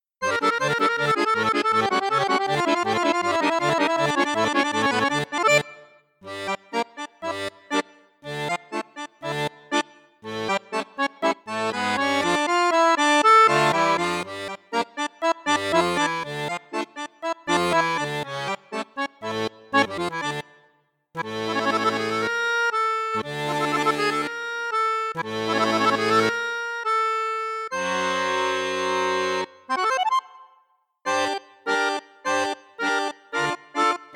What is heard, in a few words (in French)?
registre basson flute boite piccolo, accordeon